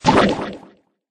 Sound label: big slime step